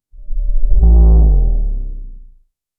a debuff sound for a, games

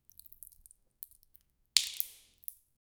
cracking a large shell, animal

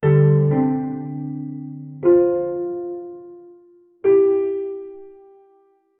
relieved calming music

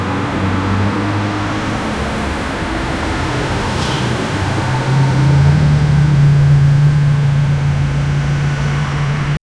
ambient city street with; urban environment

